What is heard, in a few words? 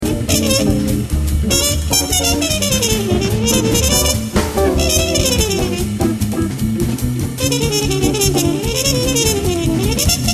orgues Hammond